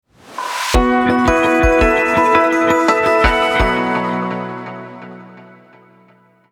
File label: jingle